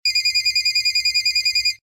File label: Cell Phone Ring